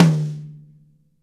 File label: mid tom; 90s sample CD